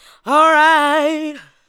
ADLIBS

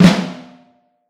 Vintage Snare Drum; Q Up Arts; Johnny.C's Vintage Snare Drum; 7X14 SLRK

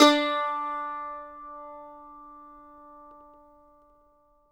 MANDO NOTES, D OPN; Sampleheads; Whole Lotta Country 1 & 2

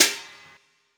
Hi Hat; Essential Percussion

